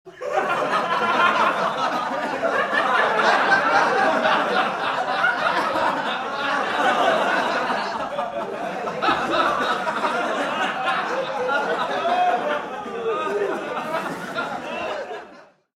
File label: Sitcom Laughter; People; iLife Sound Effects